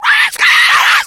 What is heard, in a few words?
rage at victim, infected; npc; szf